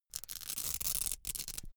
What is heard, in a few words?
velcro open cap slow